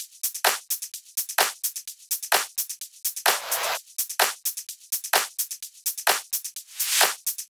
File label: 128BPM Drums; Vengeance Samples; Celebration Kit